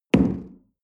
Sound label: coffee place tablecloth hard, restaurant cafe